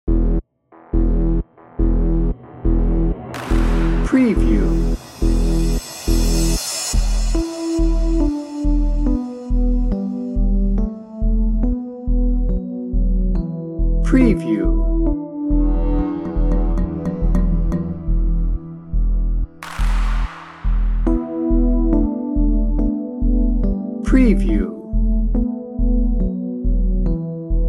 smoking gun no drums

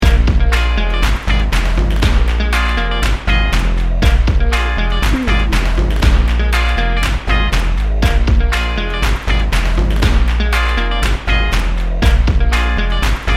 funk junkie

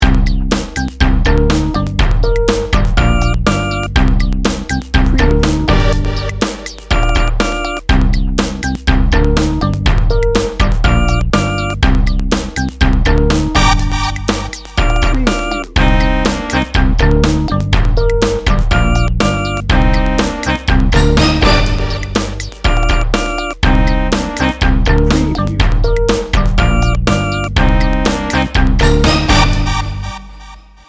swagger groove

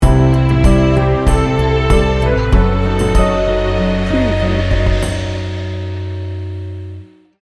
dreamy reflections sting bumper

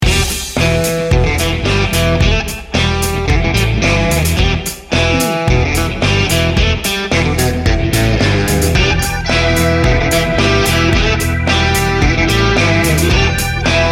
big rig run

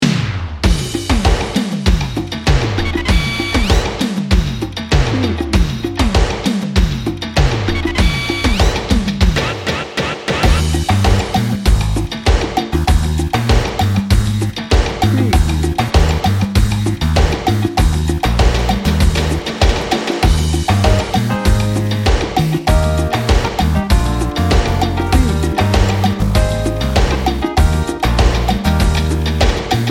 tropical party bed

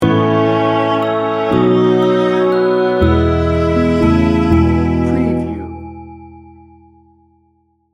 it came upon a midnight clear sting bumper

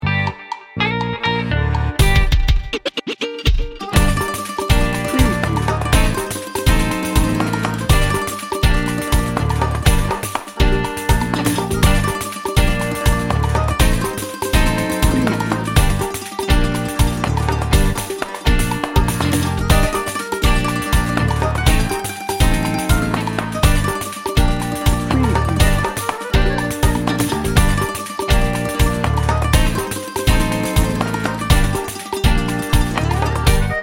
tango

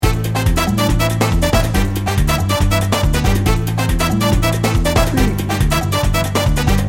salsa merengue madness loop

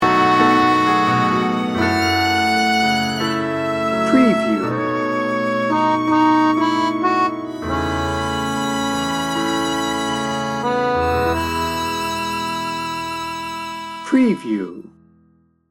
sexy tango sting bumper

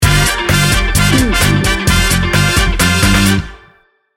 good n funky sting bumper

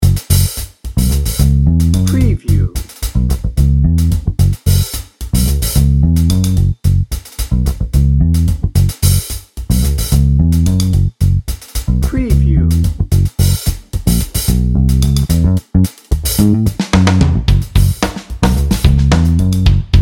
funky stumble dnb

